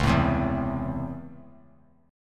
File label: C sharp Minor Major 7th Sharp 5th C sharp, chord; strummed; 13,16,15,14; 3C,3F,3A,3D